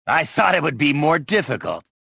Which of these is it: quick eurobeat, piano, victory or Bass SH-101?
victory